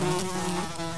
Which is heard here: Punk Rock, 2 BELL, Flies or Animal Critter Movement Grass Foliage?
Flies